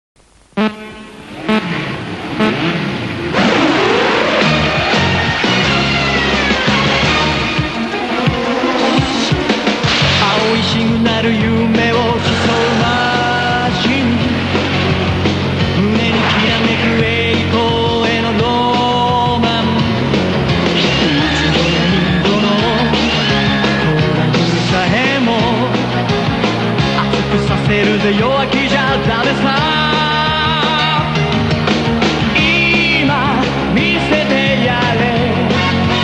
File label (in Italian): sigla cartone animato; automodelli 4wd